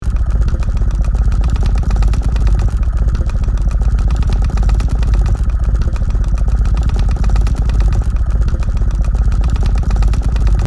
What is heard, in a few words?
Stable rotating vintage mechanism loop; gfxsounds